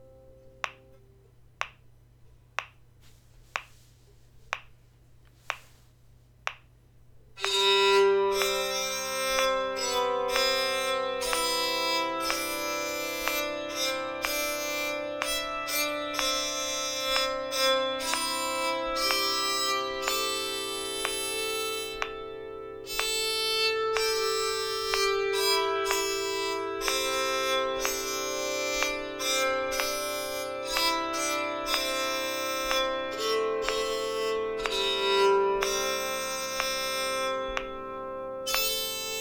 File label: Auld Lang Syne, bowed psaltery